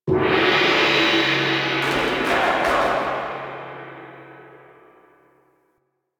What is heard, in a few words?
Ball Gong Hit; Warframe; Missions; Tenno Ball